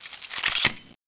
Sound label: elite reload start, weapons; cstrike